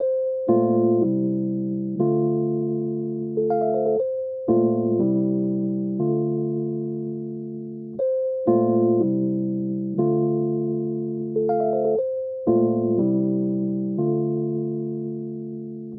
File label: dreamy rhodes dance music style, 120bpm, D minor